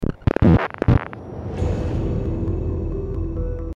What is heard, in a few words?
Glitch